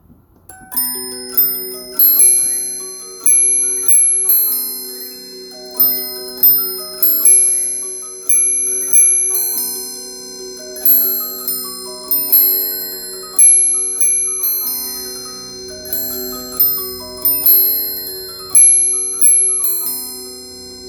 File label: Swiss music box with 3 bells playing 8 tunes; Small; off a 6 inch cylinder